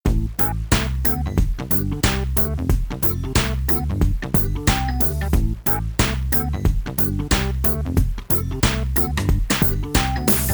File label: Music Chill; Learn to Fly 3